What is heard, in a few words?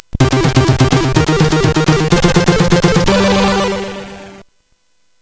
Combat Zone, sid CSG8580R5